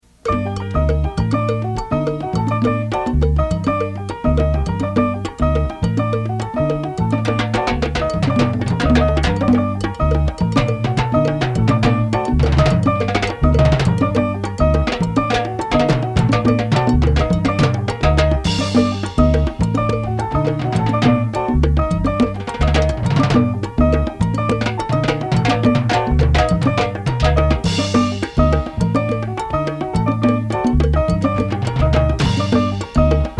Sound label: Percussion Montuno